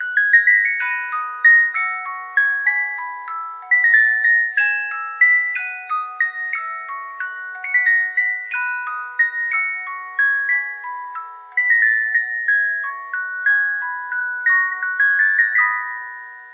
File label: Music Box Waltz Of Flowers